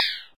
Wind; SNES; Benkei Gaiden

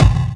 PERC FX, Dance Drums

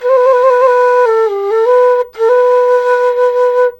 SERULING, Heart of Asia

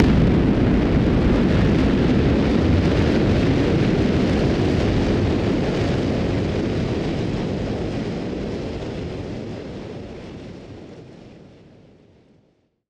Noise Bomb Harmon; musicradar; bomb factory

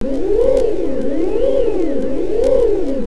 Fx Vox; Equipped Music; Loungin House

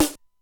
Simmons SDS-9, Drum Machines; Factory

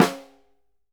SNR, Synclavier Percussion 2 - World & Orchestral

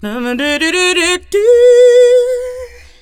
FEM VOX, Hip Hop; E-MU Formula 4000 Series Vol. 1; Hip Hop Nation; Phatt Phemmes 1

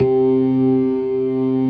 ORGAN COMBOS; Keith Emerson Signature Series

